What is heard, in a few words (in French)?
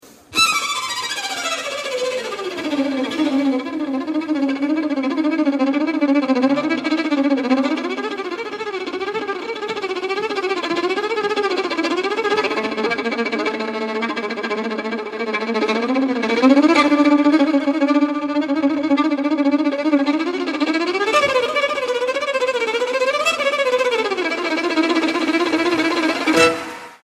violon seul